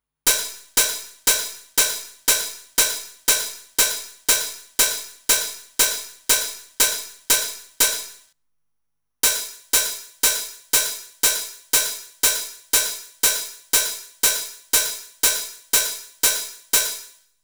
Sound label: RD 9 vs TR 909 OPEN HIHAT